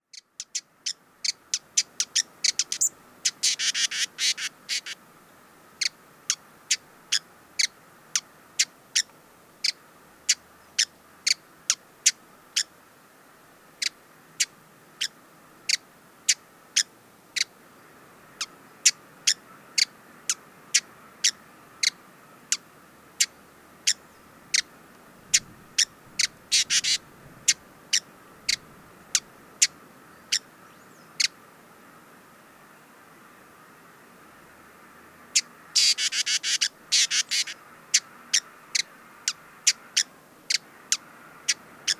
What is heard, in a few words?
Hooded visorbearer, پرنده; آفتابگیر کلاهدار